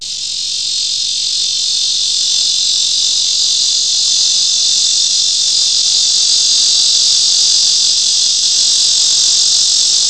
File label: lyricen, Michigan Cicadas